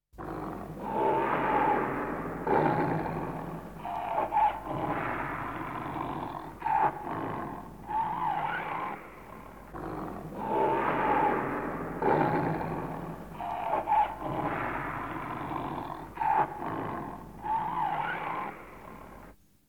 tigre tiger; animali; felini

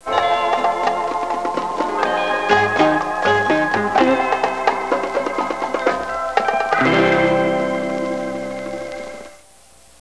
musica strumentale

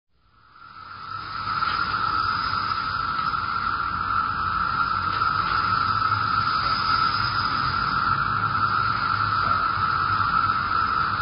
insetti insect; animali

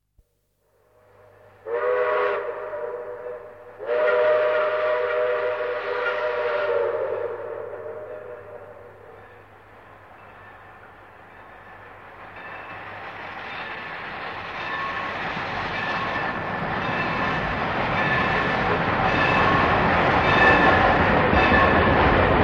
TRENO A VAPORE PASSAGGIO A LIVELLO; trasporti; treni

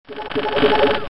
suoneria botton; beep

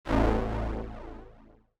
suoneria botton; beep

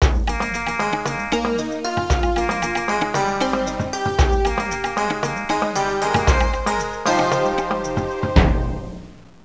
suonerie contemporanea; musica contemporary

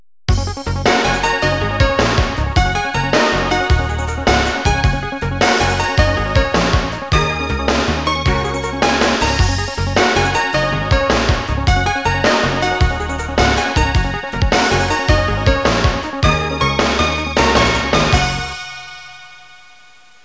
suonerie businessy

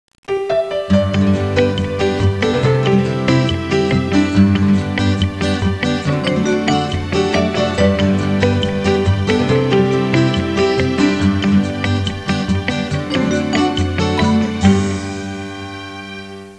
suonerie musica hawaiian

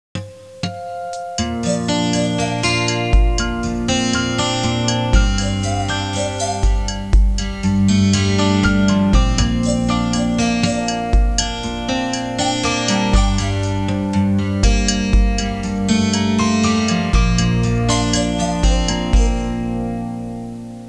suonerie musica hawaiian

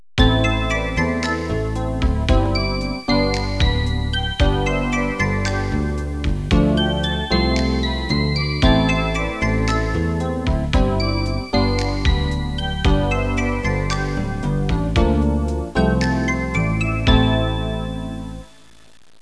suonerie businessy

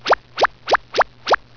Rimbalzo Pallina, cartoons

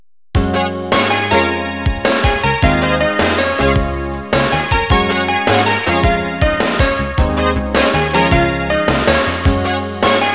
musica elettronica; electronic

